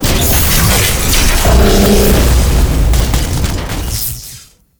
boss deth; steamer crazy

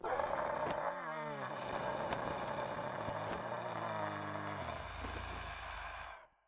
servant gargoyle idle, monster

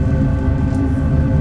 bike idle

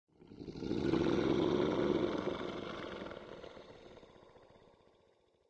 ferry, lake

